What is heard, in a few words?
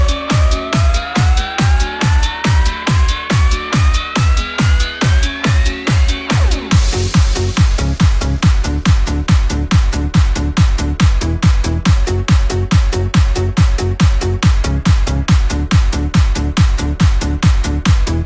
Ringtone Vivo